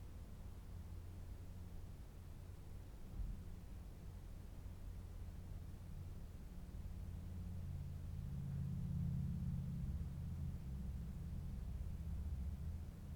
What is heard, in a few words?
Room Tone Old House